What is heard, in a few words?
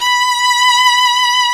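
STRING SECTION B4; WS#3 BAROQUE; AKAI S-Series CD-ROM Sound Library VOL-2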